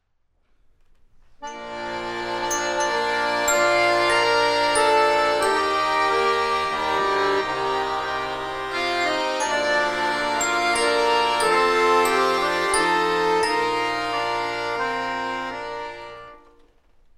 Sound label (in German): Vorspiel Akkordeonensamble; Suesser die Glocken nie klingen